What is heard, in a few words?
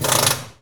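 Impact small, air tools, industrial; Air toolks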